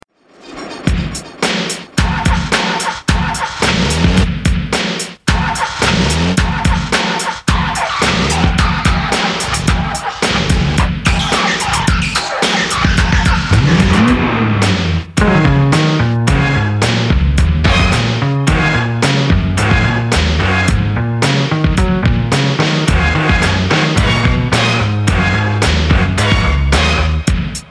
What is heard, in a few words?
beat; Demonstration du Fairlight CMI II – 1980